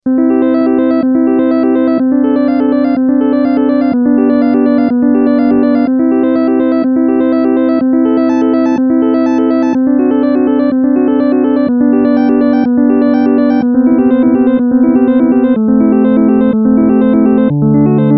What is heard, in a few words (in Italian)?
Bach Clavicembalo Ben Temperato Preludio n.1